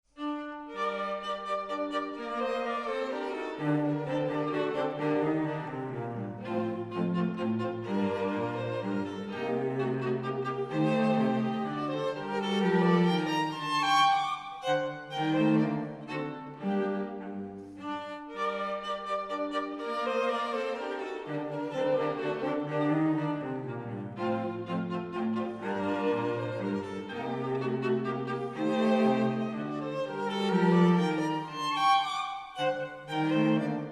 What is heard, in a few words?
String Quartet in C Minor; Ciurlionis